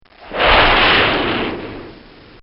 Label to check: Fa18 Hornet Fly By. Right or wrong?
Right